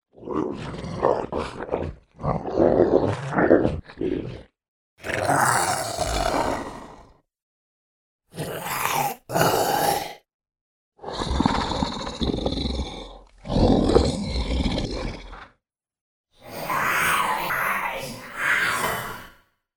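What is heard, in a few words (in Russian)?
ревущий укус зомби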